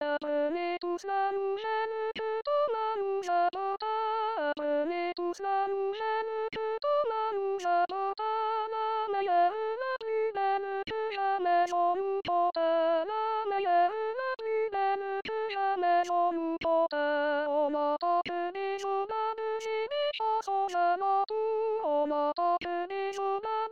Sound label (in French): voix femmes; noel de bresse